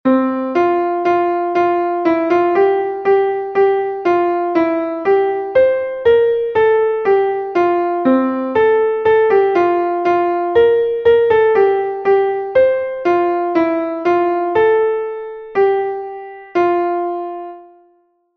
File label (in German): klavier; MelodieMeister